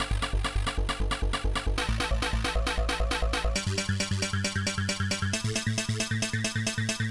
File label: Phunky Trance Syth